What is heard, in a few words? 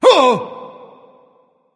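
nonverbal surprised; smite; voicepack; ullr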